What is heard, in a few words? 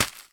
Footstep Crayon Paper; viewfinder